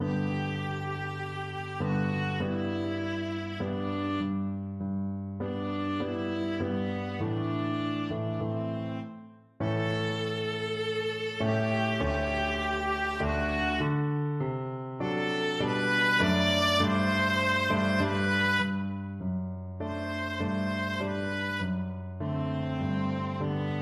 viola

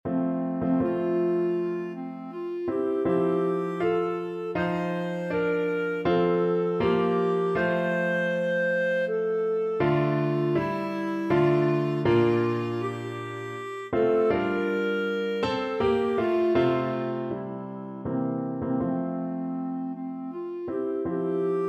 clarinet, nocturne; mendelssohn

